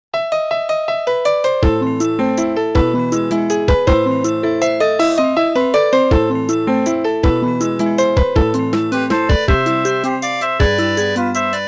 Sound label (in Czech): melodie